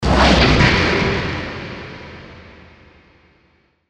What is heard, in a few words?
ambient noisy ambient impact